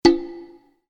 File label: UI Quirky